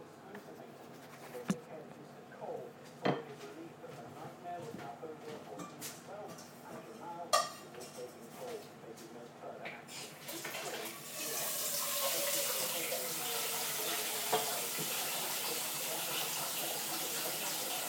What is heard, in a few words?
In the kitchen soundscape